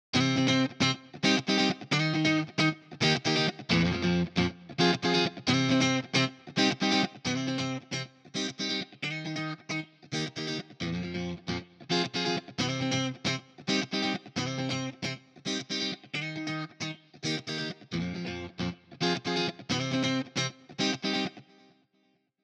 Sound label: Funky Guitar